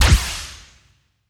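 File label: test, plasma weapon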